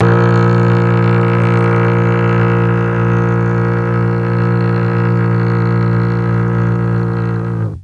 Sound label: A#1 TRON CELLO; Mike Pinder - Mellotron Archive CD